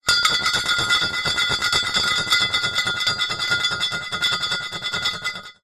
foley wood thin pole circular movement spin inside metal tube close up